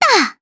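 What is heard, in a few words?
Vox Landing, Japanese; voices; heroes; Estelle; kingsraid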